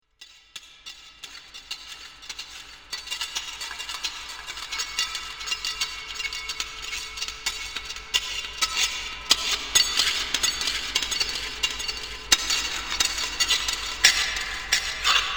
Dig Metal And Stone Impact In Mine; fantasy

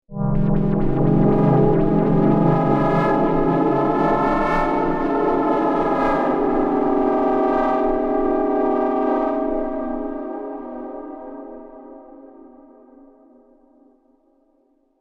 Ghostly haunting drone transition; Drones

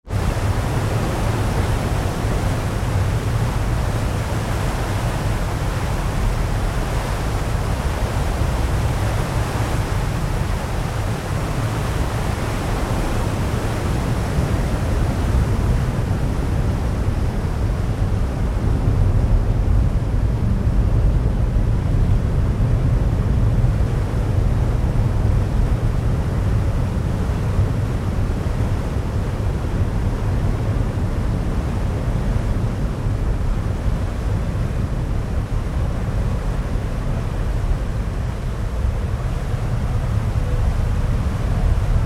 Ship Large Ferry Deck Atmosphere under Sail; Tom Hutchings

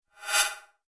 multimedia rollover, Multimedia Rollover Tone; Sound Design; McKinney Sound

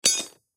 Pipe Drop Tool, Pipe Drop Tool Wrench; Drops; Foley; Objects; Blastwave

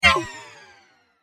Multimedia Swipe, transition

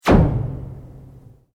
Sweep Mechanical Fast

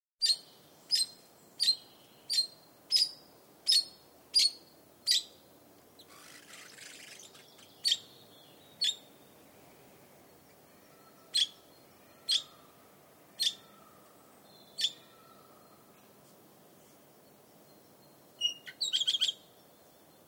Red-Rumped Parakeet; Bird; BBC

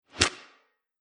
Single Whip Crack; Crime And Torture - Single Whip Crack; Whip Sound Effects; Horror Sound Effect

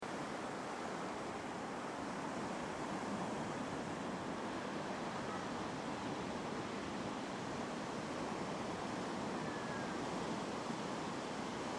Ocean Beach Sound Of The Sea Clear Waves; Nature; Ocean - Beach - Sound Of The Sea - Diffuse; CraftedSignals

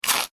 human eat crunch rye crispbread; Human Bite/Crunch Rye Crisp Bread; Eating (Sound Effects:Human); McKinney Sound

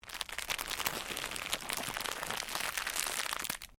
Cellophane crinkle

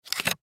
Mini Dv Tape Case, Mini Dv Tape Case Remove Tape; Remove Information Card; Technology; Tape Players; Blastwave

